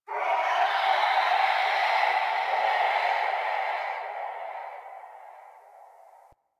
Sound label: Venus Far Distant Creatures, Ambience; Warframe; Venus Open World; Venus Landscape